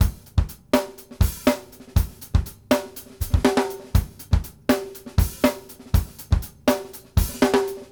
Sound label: FUNKY, 120; Sampleheads; New York City Drumworks VOL-1